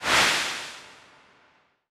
falldown

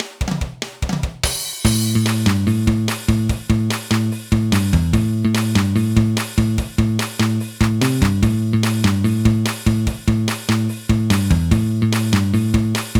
Rock Stage Karaoke; pinky street kira kira music night pop town gamerip

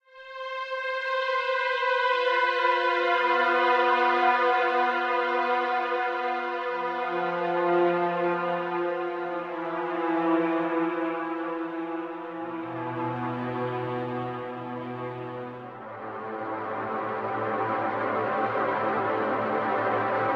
Shop Music; Deus Ex Mankind Divided; Golem Shop Music

